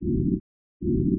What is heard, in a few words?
Noise LF 6k; Sound Illustrations